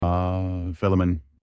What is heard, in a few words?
aaahhhhhhj